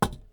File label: Metal Put Down